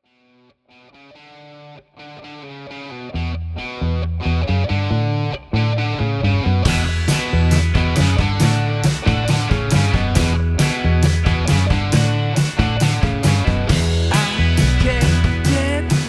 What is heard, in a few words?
Cigar Box